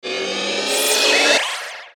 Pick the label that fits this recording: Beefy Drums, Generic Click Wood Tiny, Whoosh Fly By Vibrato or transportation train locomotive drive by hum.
Whoosh Fly By Vibrato